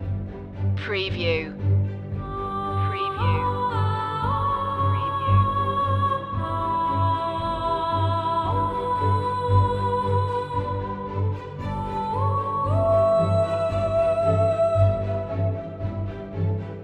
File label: forming loop